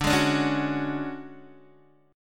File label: D Major 7th Flat 5th Guitar DADGAD, chord